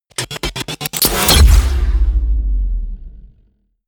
promo pad

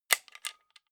small button generic

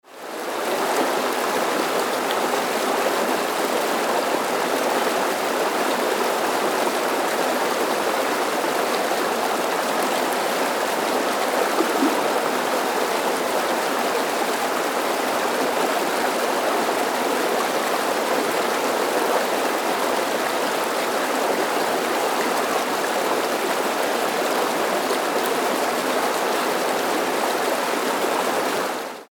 river with rapids

